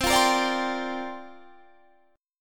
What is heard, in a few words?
C 7th Suspended 2nd Suspended 4th, Guitar, Open D